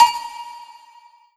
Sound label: Ensoniq VFX SD Cowbell